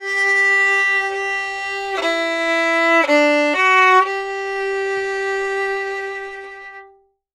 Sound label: Fiddle, Appalachian